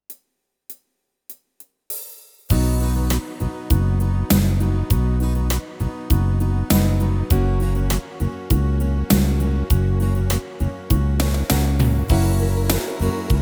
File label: style entertainer 8beat, yamaha tyros3; adria